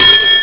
long pling; ST-XX Amiga Soundtracker Sample Packs

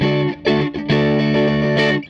Gretsch Guitar Loop

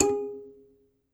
BASS KALI, Kalimbas; E-MU Producer Series Vol. 7 – Old World Instruments

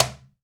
Cajon Sp; Roland SPD-S

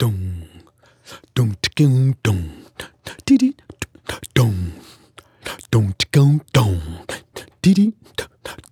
African Mouth Groove; Continental Drift Aftershocks; Vocals

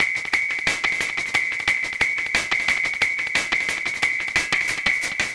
Drum Fx Loop, Electronica; Drums & Percussion; FX Kits; Sonic Foundry (Sony Creative Software) - Electronic Point Blank

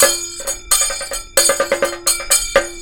KATAK, Indian Traditions; USB Soundscan vol.45; AKAI

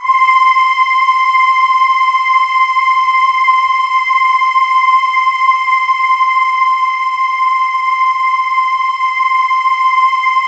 C5, Synth Leads

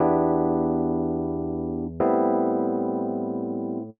120 Rhodes E, Keys, Nu Jazz House; PowerFX

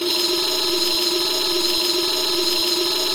Rek Roll; Roland BK-5; Percussion

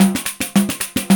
PAN, ETHNIC PERC; Roland - LA Composer vol. 1

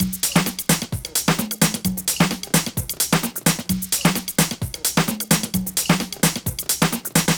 Quantize Swing, Drum Loops, 130 BPM; Underground UK House & Electro

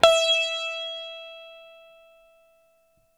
SITAR E 4, CORAL SITAR; East West; Steve Stevens Guitar Samples Collection

